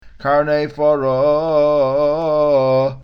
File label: karnai parah, cantilation